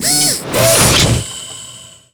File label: sphere socket close; Portal 2